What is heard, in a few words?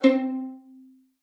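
violin pizzicato C5; Xsample VOL-5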